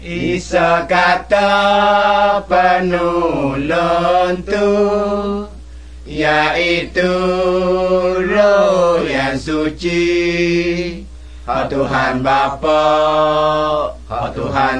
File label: songs malay pattani